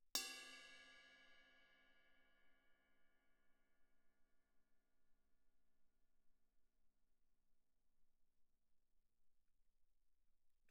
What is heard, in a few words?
crash stick bell mf, suspended cymbals; Percussion